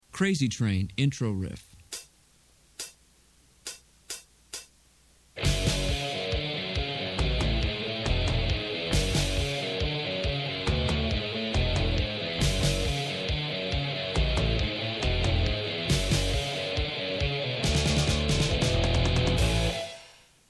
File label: intro riff